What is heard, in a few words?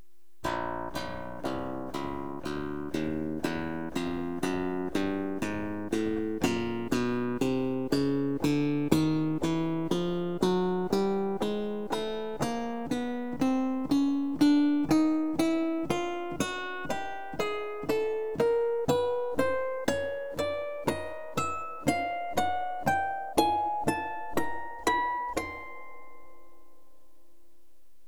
synth scale